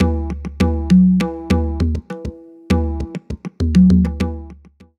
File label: pakhawaj